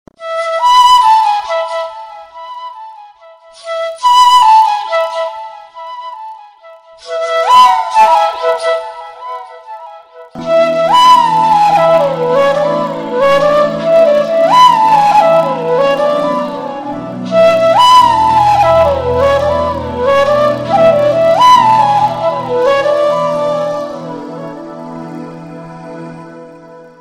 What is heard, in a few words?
Flute Ringtone; Tamil Ringtones